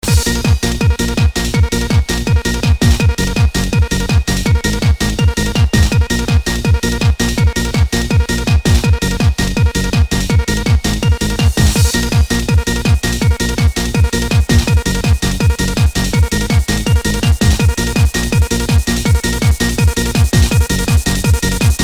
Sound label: Tunnel Trance; Tunnel Trance Force